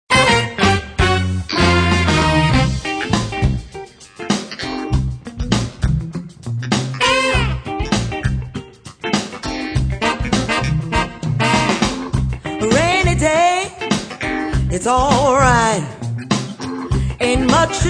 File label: Blues Comes To Call